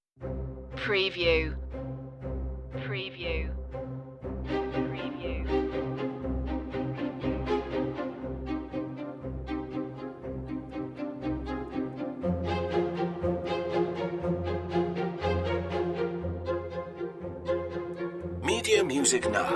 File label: dance of the strings no perc